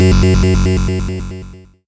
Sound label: Lead, 8-Bit; Games And 8-Bit Kit Volume2; atomsplitteraudio; chiptune